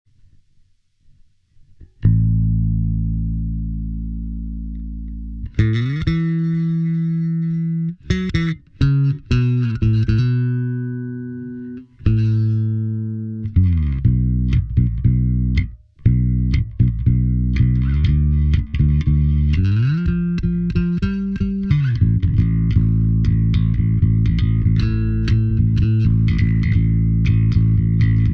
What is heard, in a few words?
alright bass